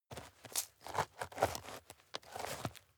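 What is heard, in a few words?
step dirt heavy boots scuff, footsteps; schritte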